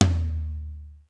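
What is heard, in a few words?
low ambient tom; sr16 tom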